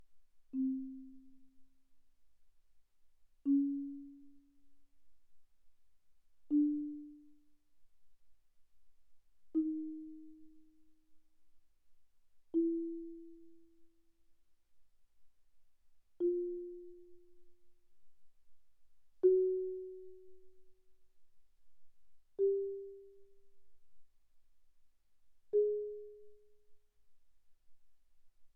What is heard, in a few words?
Marimba yarn pp C4 B4; Percussion